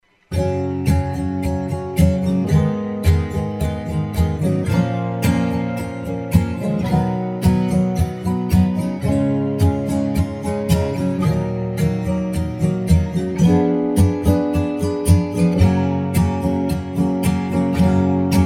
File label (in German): Gb Fis Dur Akkorde